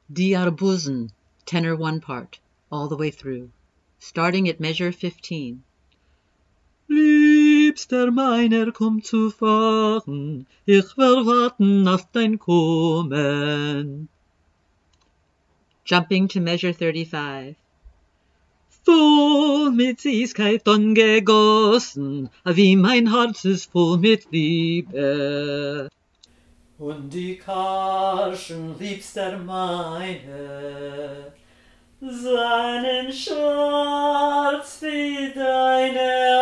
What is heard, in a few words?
Tenor I; all the way through; Di Arbuzn